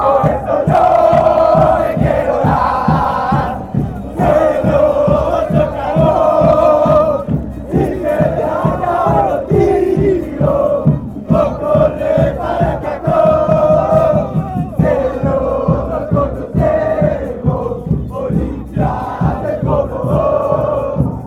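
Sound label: club olimpia chant, football chants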